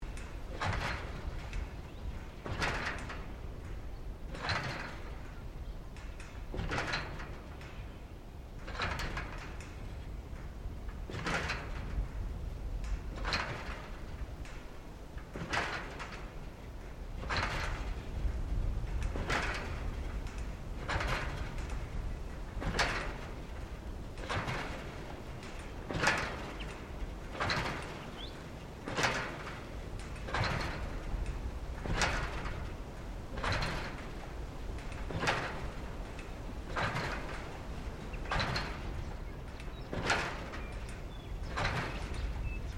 Wind Mill Wind Pump Old Operating, Australia windpump